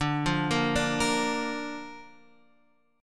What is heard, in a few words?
D Minor Sharp 5th D Guitar Standard; x,5,3,3,3,6; Dm#5 arpeggiated